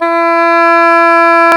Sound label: Cor anglais; CMI